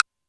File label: Hi Foley, Alesis D4 drum machine